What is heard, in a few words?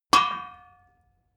metal impact bucket solid